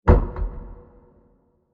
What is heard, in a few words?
Deep Impact Blast With Dropping Element And Reverb; Blasts; Crafted Signals